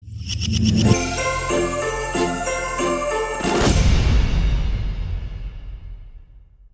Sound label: Sweeper; jingles noel; carpentras